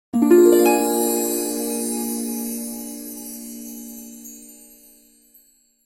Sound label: game end